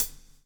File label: BLUES TK, Backbeat; Spectrasonics - Backbeat